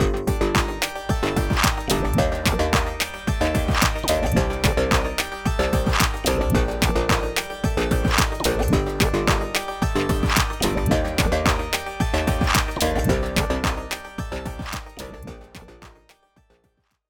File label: timeshaper on